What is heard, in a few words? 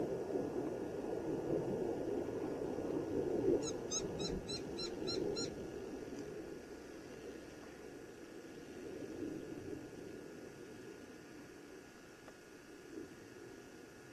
woodpeckers; Hi8